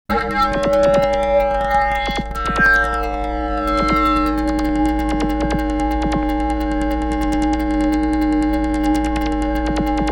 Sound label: Planetary Machine With Stereo; Bizarre Planet